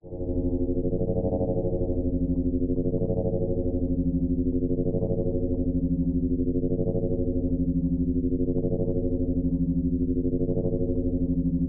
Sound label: science fiction metallic force field; zapsplat